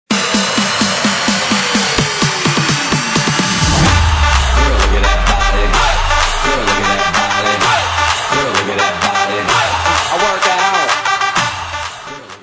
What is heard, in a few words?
DRUMS